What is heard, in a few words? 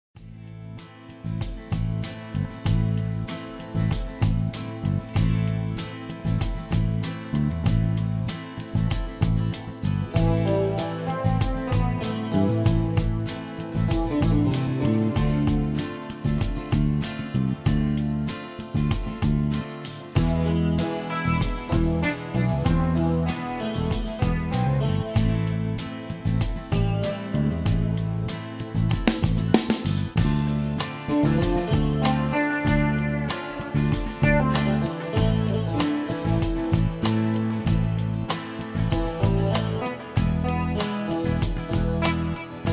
TYROS CLEAN GUITAR